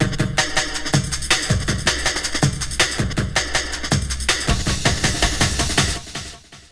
Essential beats

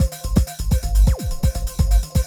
BEEPER; Loops; Distored Reality

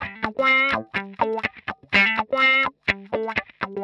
125 X wah A, wahwah; PowerFX - Nu Jazz House

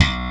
POPPING; CMP - Analogon; HARM; POPPINGB1

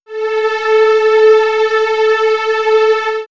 Swirls String Vox, pads; X-Static Goldmine

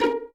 Violins pizzicato A#3; Best Service ProSamples vol.51; Classic Orchestra 2

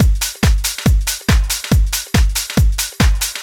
Kick Loop, 140bpm

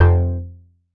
bass classic dense F; Polyend; ARC Noise

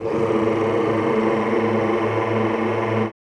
CHO Rrrr

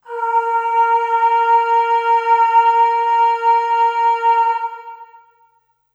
Aah Long A#3 Short Reverb; Harmonic Building Blocks

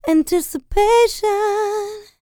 LICKS, DANCE; ILIO; Vocal Planet

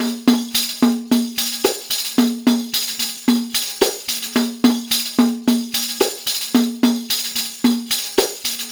PERLP, ArabianTraditions

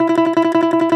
BALALAIKA, Russian Strings; E-MU Producer Series Vol. 7 – Old World Instruments

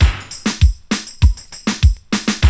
99 BUBBL, Loops; AMG; Now CD-ROM (Roland)

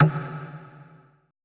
LOGDRUM E1 L; BPC DRUMS

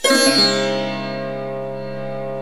TAM SIT SAR

